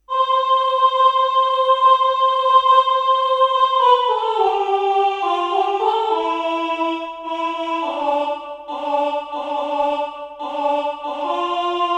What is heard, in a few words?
mezzo soprano; Chants